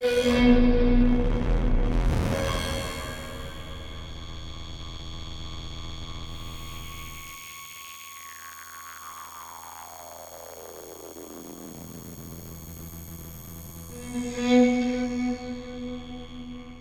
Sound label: Music Stinger Reveal